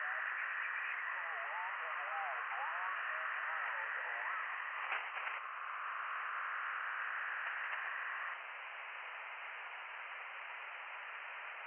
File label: from radio, 7177KHz